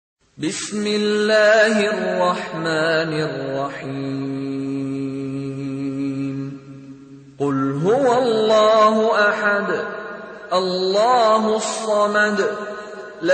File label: surah ikhlas; Islamic